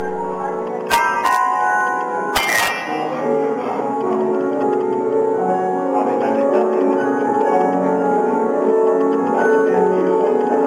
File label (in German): Klavierhaus; West